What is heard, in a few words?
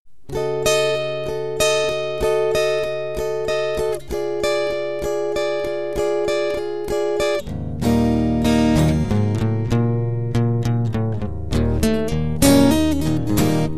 akustika chillout blues